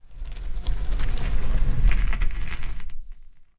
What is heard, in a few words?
SCRAPE Hollow Deep Fade In and Out (mono); SCRAPES & SCRATCHES; Universal Sound FX